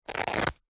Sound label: clothing bum bag belt velcro rip; zapsplat